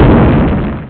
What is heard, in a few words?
boom; Critical Mass